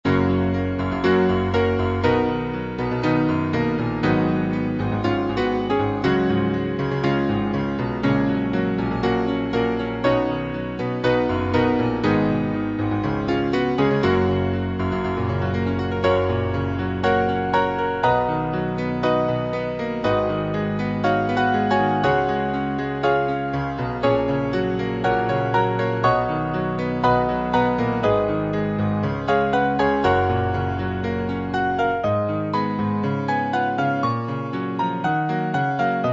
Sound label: piano; feseisen